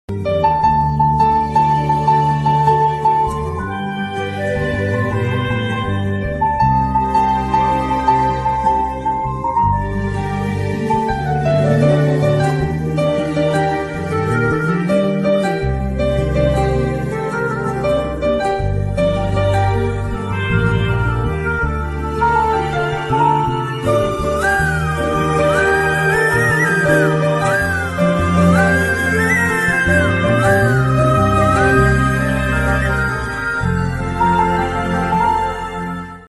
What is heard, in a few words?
ethirneechal bgm ringtone love bgm